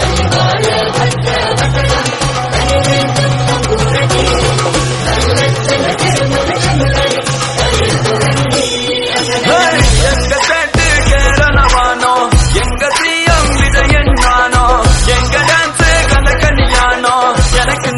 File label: kerala song